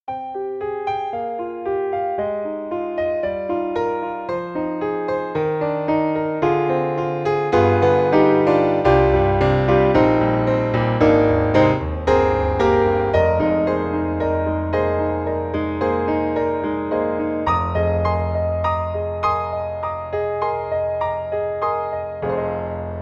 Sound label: Mini Grand Concert Hall Front Row; AIR Music Technology